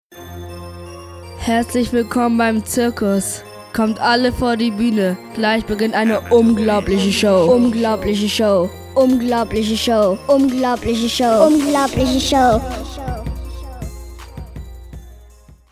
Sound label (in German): Zirkus